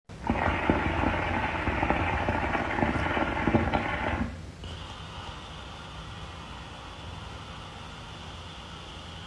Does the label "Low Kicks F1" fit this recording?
No